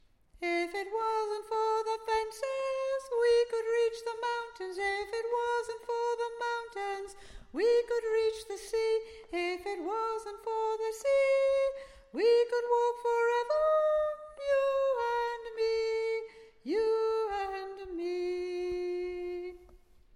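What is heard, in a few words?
Soprano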